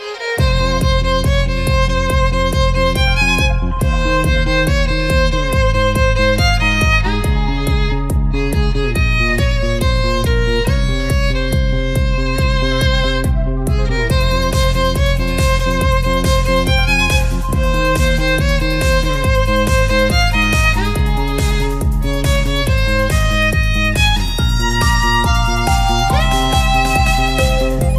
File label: Dubstep